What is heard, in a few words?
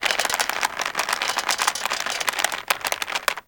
Rope Creak, Carnival; Warframe; Ambience; Grineer